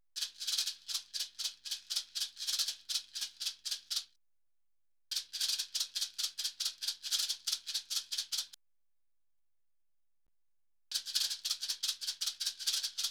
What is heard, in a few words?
Salsa Picante; Masterbits; World Zone VOL-3